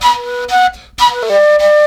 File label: FLUTE GRV; Best Service; World Colours